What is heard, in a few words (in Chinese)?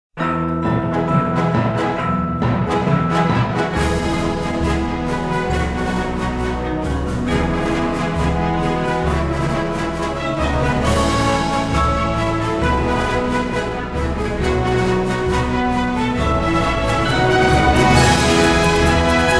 退场音乐; 校园礼仪活